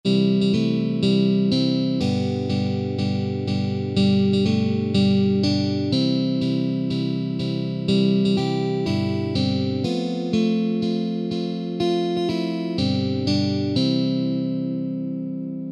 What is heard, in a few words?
Happy Birthday Guitar Fast Tempo